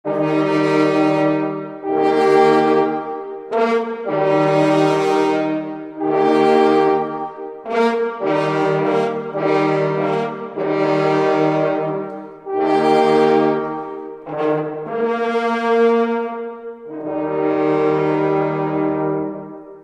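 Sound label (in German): JAGD VORBEI und HALALI Jagdhornbläser; Schenna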